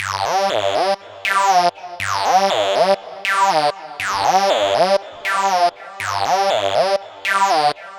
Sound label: PortaSyn; Dr. Fink's Funk Factory